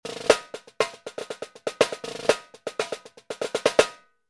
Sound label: gretsch blackhawk